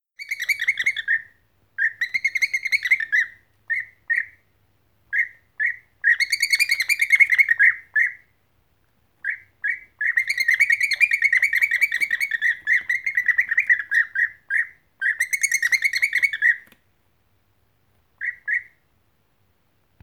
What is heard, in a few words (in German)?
Bienenfresser; Freising FS; Elsa Loessner